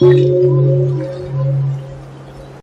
Chime Loud

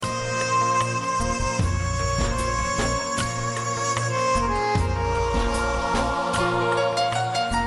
Instrument

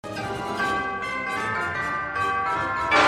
bells; Smurf

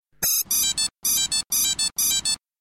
electronic

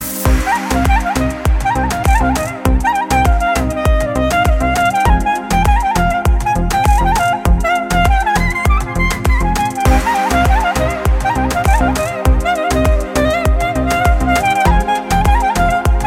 armenian clarinet

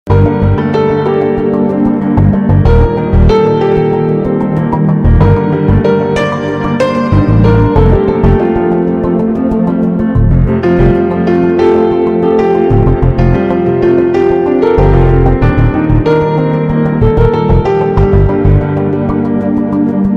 Instr

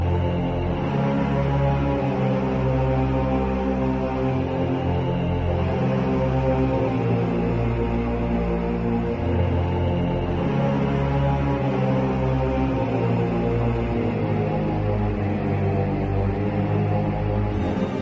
Iphone4 Dark Ring Tone

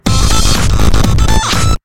Text Tone; Bionic

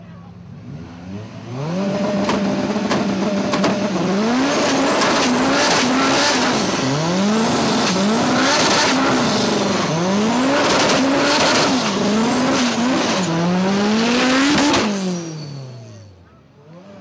Honda Civic Extreme

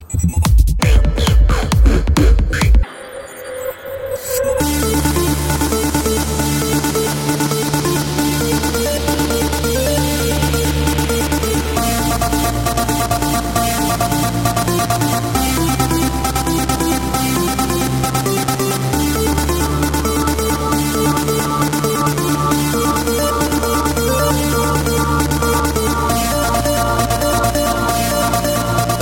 System Trance Ring

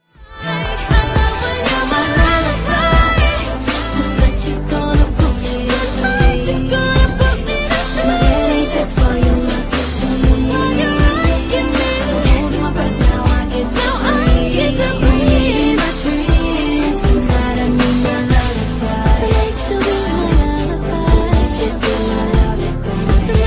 Lullaby

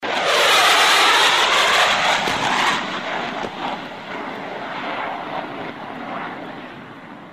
Jet Fighter

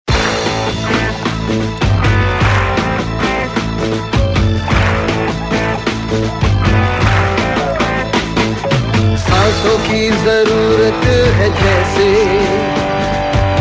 Garba

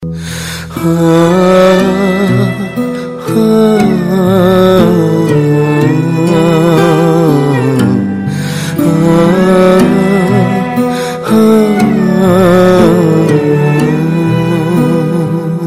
Most Saddest Tone

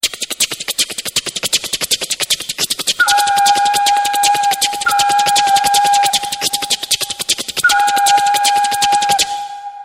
Beat-box Train

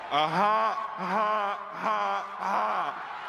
mock laugh; Kawhi

